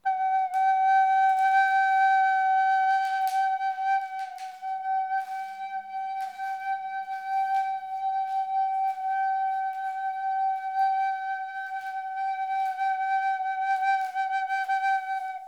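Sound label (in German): sony umrundung flöte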